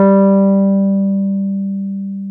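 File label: piano, Wurly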